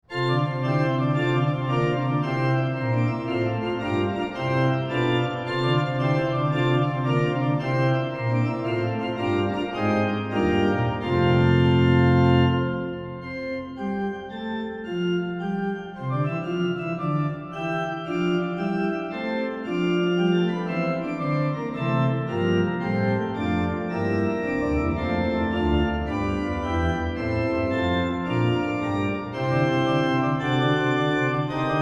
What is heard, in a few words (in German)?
capriccio die kuhglocken, organist; Andreas Willscher